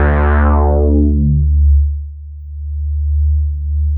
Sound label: bass, synths